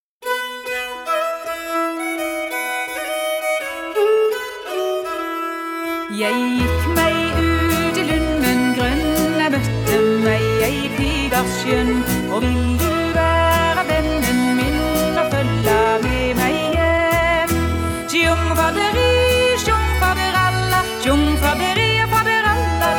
Reinlender; Lunden

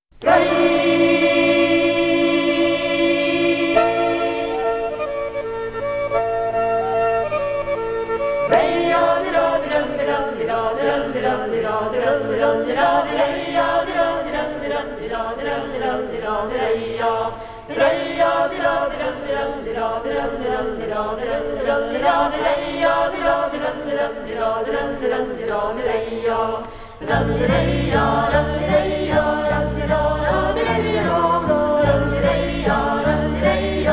Rorospols

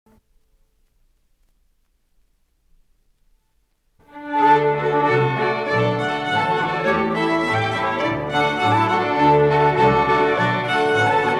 Snoa

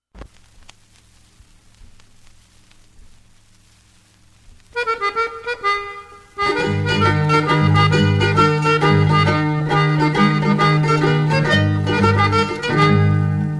Folk Dance Music; Robin Ddiog

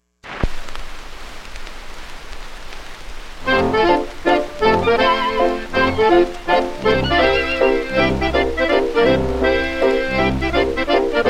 Hambo, Folk Dance Music

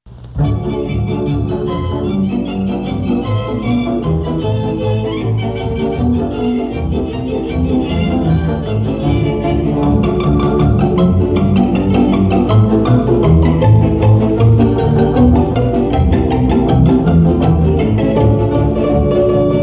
Czardas, Folk Dance Music; Tubarosa

